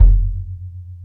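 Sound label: bass drum hit, roots reggae; Sounds Good - Roots Reggae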